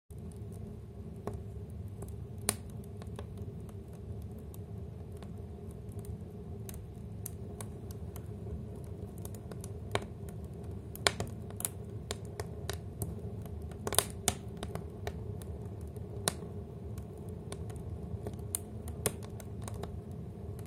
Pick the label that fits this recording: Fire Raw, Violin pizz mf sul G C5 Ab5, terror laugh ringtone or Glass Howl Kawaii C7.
Fire Raw